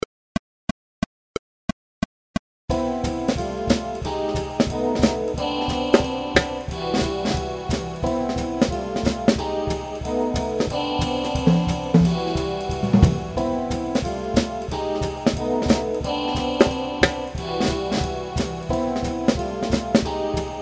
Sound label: Diminished II V Substitution Backing Track